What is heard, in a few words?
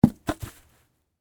Traffic Cone Over, Industry, Traffic, Cone, Knock, Over, Grass, Orange, Road, Cone; Traffic And Car